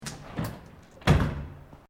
Door Aluminium Glass Pivoting Close, Industrial doors; Miscellaneous Door Sound Effects; Miscellaneous Doors (Sound Effects:Doors)